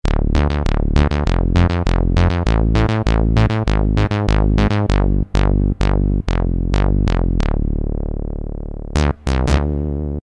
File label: synth bass, Kurzweil K2000; BASSES; Kurzweil K2000 V3; Extra Programs